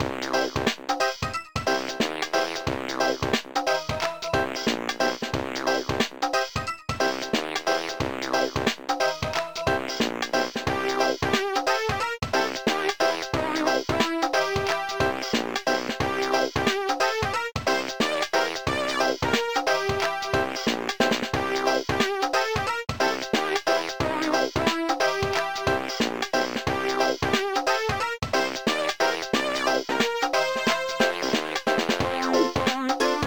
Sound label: Main Menu Level BGM; Titeuf Ze Gag Machine; GBA